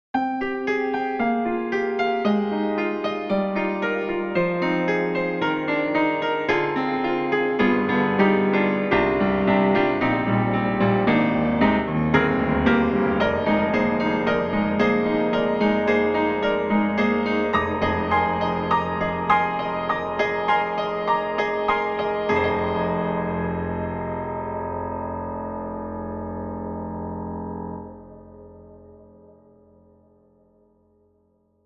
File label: Soft Pad and Piano; Steinberg HALion 6; HS3 Combis